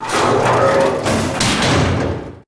door

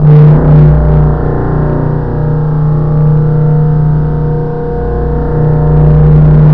odd charge

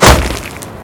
stone pick stone

